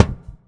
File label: Clang, Kicks; Airwindows